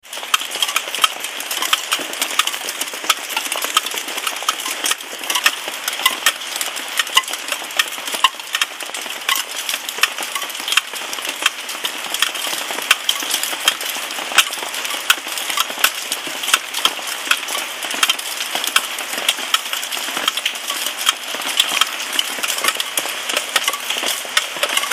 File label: koenji rain; field recordings; slater